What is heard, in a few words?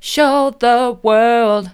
mid, vocal; show the world